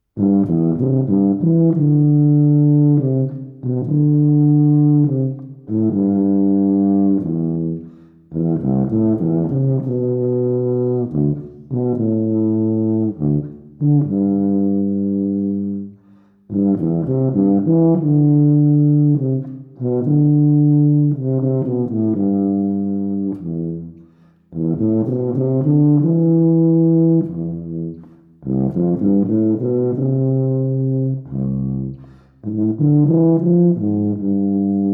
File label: Tuuba, Legato Etuder for Tuba; etydi nro.1; John Shoemaker